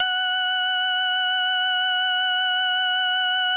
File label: F#5, organs; Music Store Sound Service; Einstein